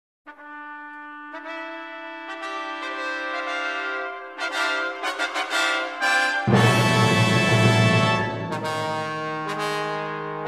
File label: Pro Brass Fanfare